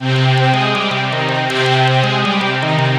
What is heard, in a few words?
ARP; Synapse Audio Dune; SA Dune